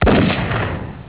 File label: Rifle